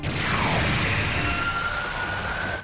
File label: Heavy Charging Effect